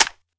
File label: sg553 clip hit, weapons